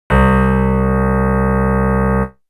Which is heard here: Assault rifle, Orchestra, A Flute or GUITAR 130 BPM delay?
GUITAR 130 BPM delay